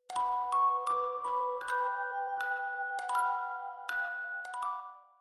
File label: start ambient